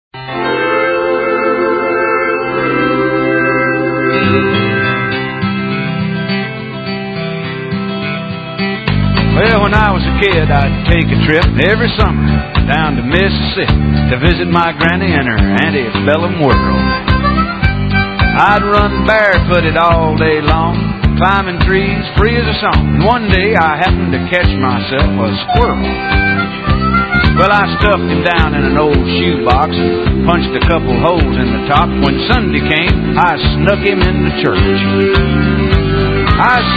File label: squirrel song